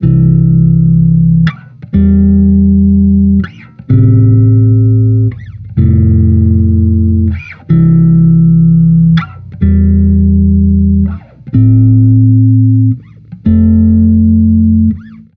Amped loop 125 C, Electric Bass Loops; Slate Digital BASSHOLE Sample Pack

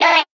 vocal glitch it Em; SD AURORA Sample Pack; Slate

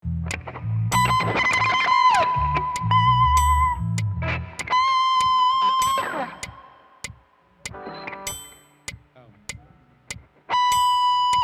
Strings Emphasis Mix

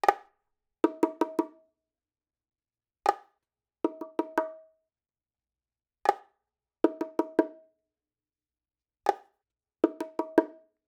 latin bongo loop 80

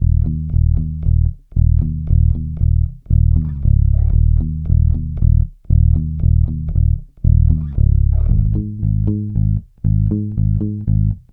Bass loop 116 Ebm, Electric Bass Loops Direct; Slate Digital BASSHOLE Sample Pack

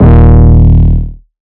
808 Bass Swipe Eb3; Slate 808 Deep Dive; SD Signature 808s

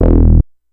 Synth oneshot E, Synth Bass One Shots; Slate Digital BASSHOLE Sample Pack

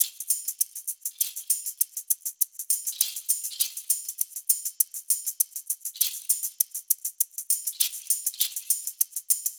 hat loop 100, Drums; Pop; Hat Loops; fern; Slate; SD PASADENA Pop Sample Pack

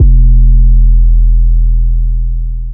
808 yummy E; Hip-Hop; Tonal; Slate; CAICOS Hip-Hop Sample Pack

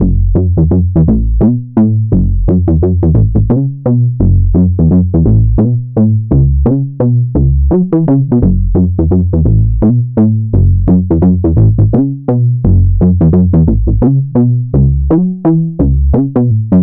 Synth loop 171 F#m, Synth Bass Loops; Slate Digital BASSHOLE Sample Pack

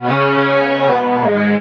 vocal solar, G#m; SD AURORA Sample Pack; Slate

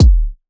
kick; Slate; SD CAICOS Hip-Hop Sample Pack

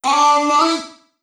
Omnivox oneshot Em, Vocal; One Shots; Slate Digital Omnivox Vocal Sample Pack

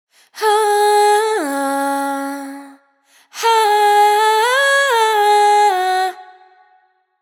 riff 160 C#m wet, vocal; Slate Digital Omnivox Vocal Sample Pack

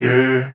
vocal C; cool; Slate; AURORA Sample Pack; Vocals

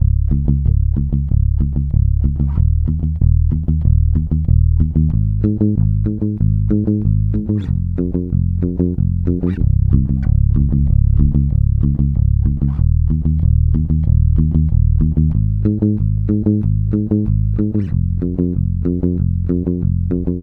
Bass loop 94 Ab, Electric Bass; Electric Bass Loops; Slate Digital BASSHOLE Sample Pack

